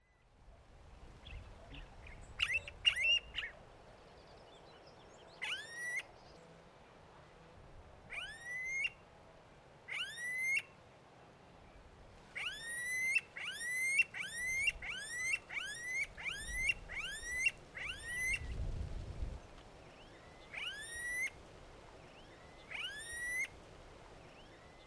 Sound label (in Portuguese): Anu preto Crotophaga ani; Jacauna